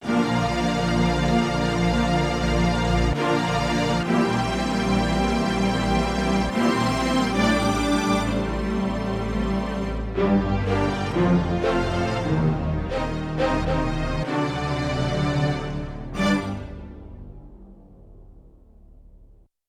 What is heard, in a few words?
Orch Wash Strings 4V; Yamaha TX16W